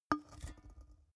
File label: big can empty put down rattle